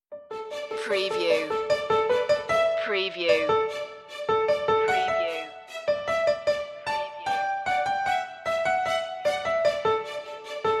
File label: no bass